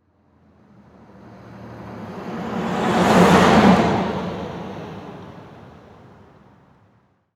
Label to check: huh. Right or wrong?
Wrong